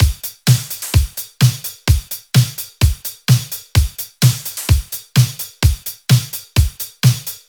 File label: Housebeats 128BPM; Vengeance Samples